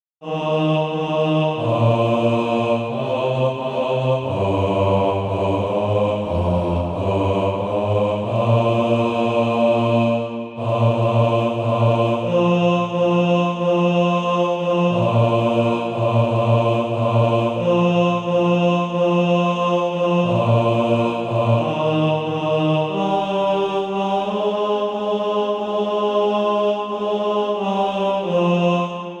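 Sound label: bass, Sheet music (SATB) including Practise Music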